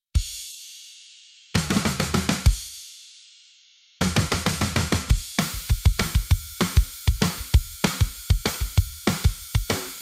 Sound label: Drums